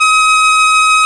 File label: ACCORD; Roland - LA Composer vol. 1